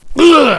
HURT PAIN MALE; combatarms2

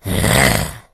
miss, zombie; justzombies

